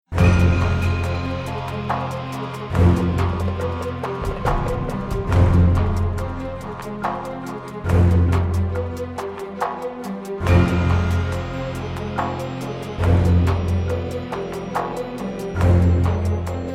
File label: start round, music; valve